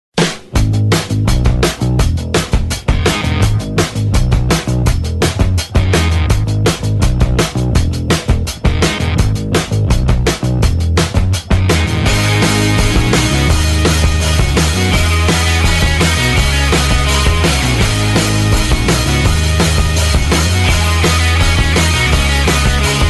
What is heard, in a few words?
battle